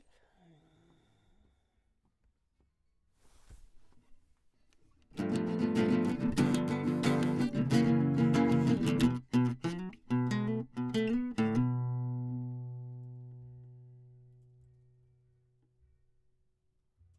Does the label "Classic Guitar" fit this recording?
Yes